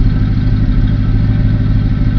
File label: car idle